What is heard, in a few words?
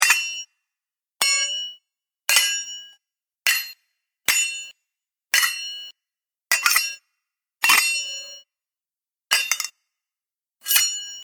Sword Sounds, Various metallic sounds of clashing, drawing swords.; Combat